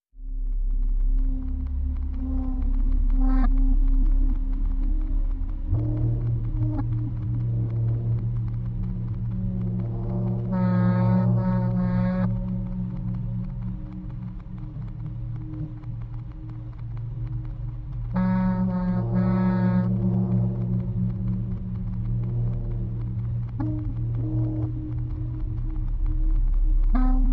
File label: Low Drone Only